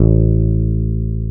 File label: FEND P-BASS A1